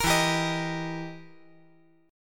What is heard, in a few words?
E Minor Major 7th Flat 5th E down